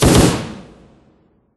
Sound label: Timeup